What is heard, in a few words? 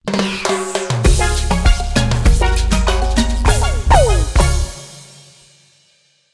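free Spin Achieved; reef raider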